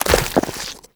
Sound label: Step Sludge, Footsteps, Walk; Warframe; Tenno